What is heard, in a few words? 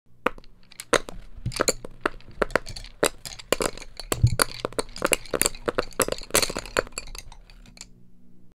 Dropping pencil; افتادن; افتادن مداد